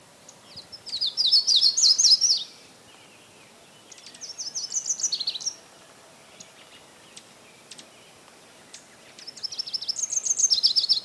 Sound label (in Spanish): Reinita rosada Cardellina versicolor; Peter Boesman